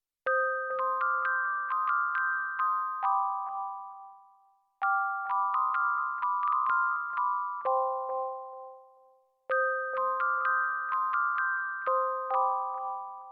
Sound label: Tiny Sad Bells